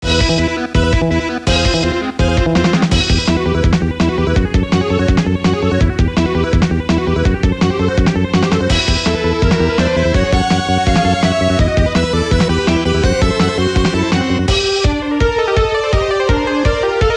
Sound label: Retro; Dragon Ball FighterZ